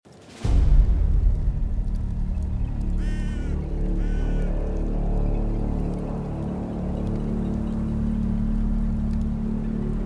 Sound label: ATMO loop